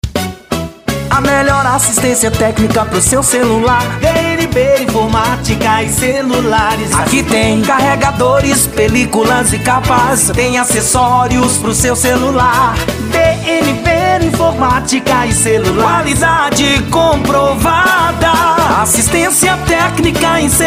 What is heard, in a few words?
dnb; celulares